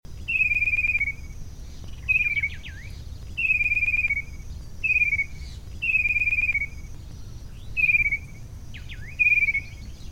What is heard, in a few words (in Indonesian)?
tepus gelagah